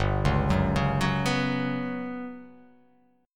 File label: G Major 7th Flat 5th; 3,4,4,6,6,6,x,x; down